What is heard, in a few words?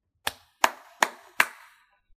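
Hands Clapping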